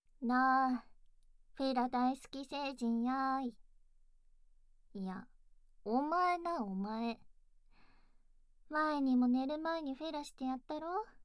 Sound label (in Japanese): 罰ゲーム 亀頭フェラマッサージ, ASMR; ムチムチでダウナーなニート嫁の奉仕 3